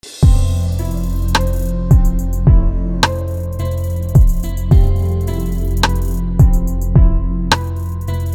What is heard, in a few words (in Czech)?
melodii beat; k vasemu zpevu